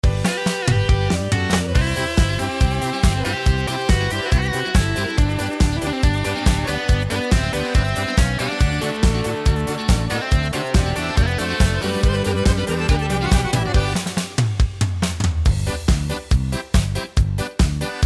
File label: Midi Yamaha